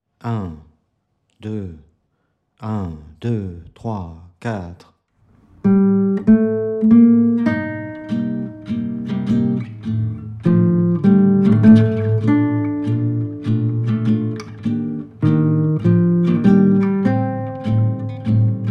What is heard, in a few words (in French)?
exercice 100bpm